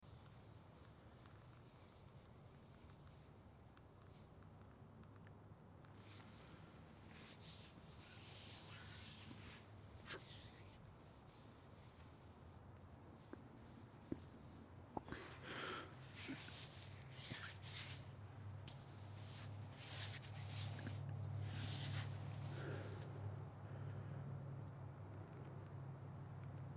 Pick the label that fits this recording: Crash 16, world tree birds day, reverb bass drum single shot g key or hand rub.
hand rub